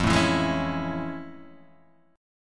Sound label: A Major 7th F; AM7/F Chord {1 0 2 1 2 0} strummed